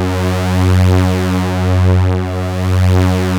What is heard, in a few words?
OB F#3 -R, Rare Synthesizer; Hollywood Edge - Giorgio Moroder Rare Synthesizer Collection; OB 4 VOX.